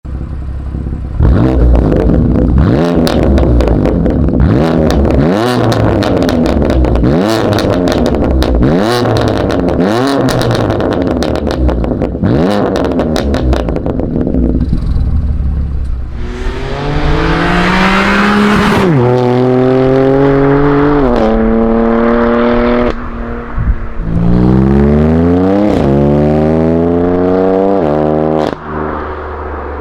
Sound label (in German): M4 M3 F8X Abgasanlage; infinitas